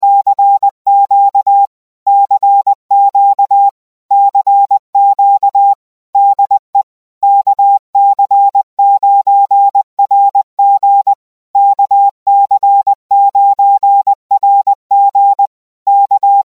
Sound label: cq 20 wpm 800 Hz